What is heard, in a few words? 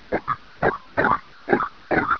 ag idle, agrunt